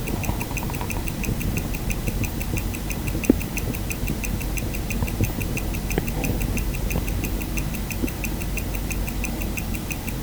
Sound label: citizen automatic sound